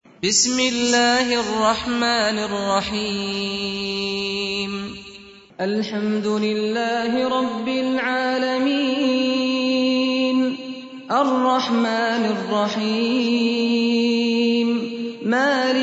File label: Alfatihah Recitation; Saad Al-Ghamdi